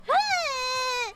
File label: losing noises